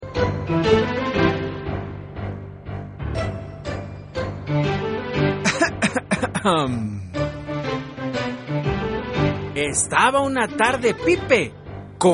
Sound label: pipe